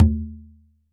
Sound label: FIST, CONGAS; Q Up Arts; Denny Jaeger Private Collection